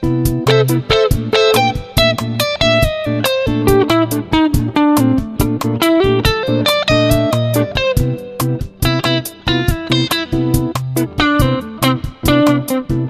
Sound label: Bossa Blues